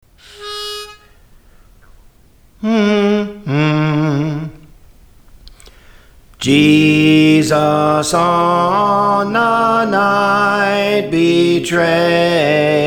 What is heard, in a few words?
Soprano; Praise For The Lord Training